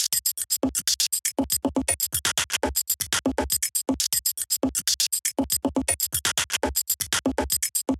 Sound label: machine tribes loop